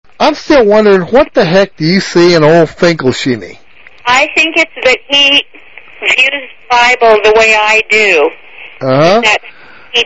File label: Moaner